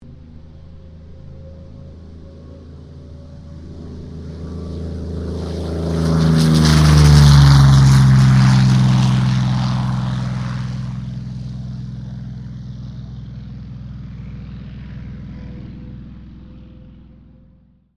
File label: AIRCRAFT P-40 KITTYHAWK TAKEOFF; P-40 Kittyhawk / Warhawk / Tomahawk; Airplanes (Sound Effects:Vehicles)